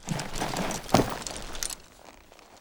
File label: mount, horses; Cosmic Rage